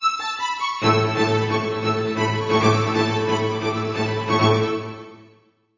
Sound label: Motivational Generic